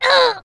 male die; FlyForInsanity

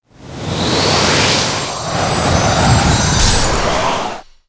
Skill water magic; FlyForInsanity

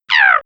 Wind Dmg; RUGAL; Fly For Insanity

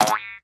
bubblegum projectile bounce; Candy Crush Friends

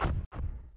move; Fly For Insanity

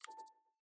notification; Opera GX

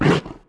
attack; Fly For Insanity

